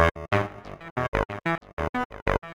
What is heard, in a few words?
Heavy Industry Syn Drop, Keyboards